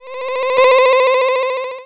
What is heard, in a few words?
game interrupted; kokogems